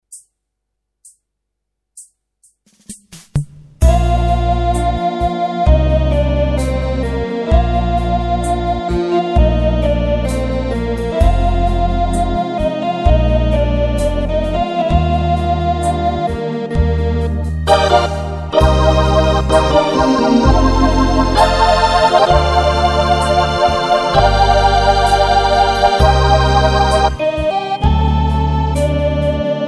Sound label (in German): theaterorgel